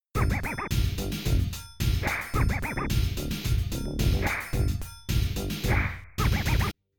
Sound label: Opening Rap Tune